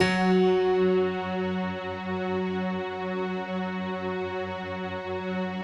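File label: bright electric piano strings, Slow Octave Piano Strings; Optical Media International - Sonic Images Library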